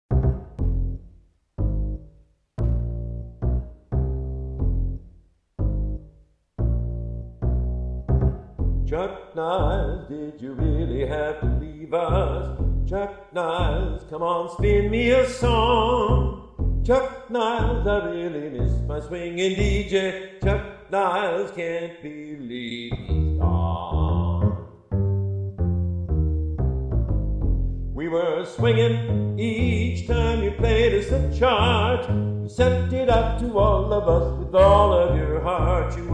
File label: bass and Vocal mix small room